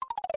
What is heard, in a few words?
stomp bones; smw